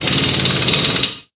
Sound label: Drill; blue archive